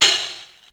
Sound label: Bad Tambo, Ensoniq ASR-X Pro